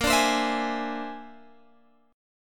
Bb 9th Flat 5th Bb down Guitar Open G

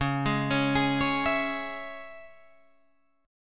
C sharp Minor C sharp Guitar Drop D, chord; 11,11,11,13,14,12

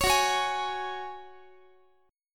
F sharp Suspended 2nd, Guitar; strummed; x,x,16,16,17,19; down; 4A,4E,5A,5D,5Gsharp,6Csharp

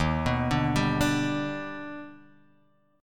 Eb Augmented Major 7th Eb down; Eb+M7 arpeggiated; JGuitar

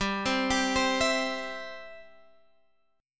C Major G

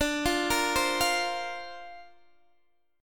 D-Minor 7th Sharp 5th, D, Guitar, Open D

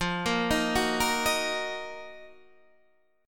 Eb Minor F; Ebm/F arpeggiated; JGuitar

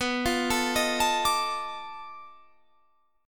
B 7th Sharp 9th Flat 5th, down, guitar; B7#9b5; arpeggiated

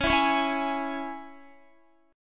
Db Minor, Guitar; strummed

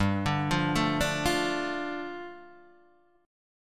G 7th Suspended 2nd G, Guitar Open D

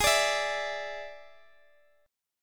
D Suspended 2nd Flat 5th G sharp, guitar

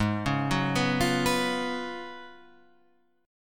Gb Major 7th Suspended 2nd Suspended 4th

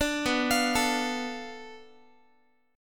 B Minor 7th Flat 5th, Guitar; Bm7b5; arpeggiated; 17,19,20,19,x,x; JGuitar

